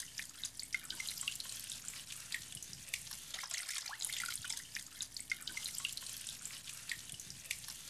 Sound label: swim On Place; Singles; French Version